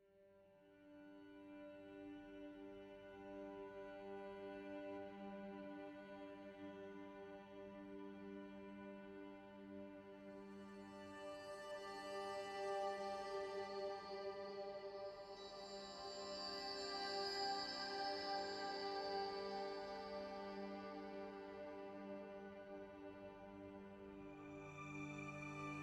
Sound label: tonal Amb Full G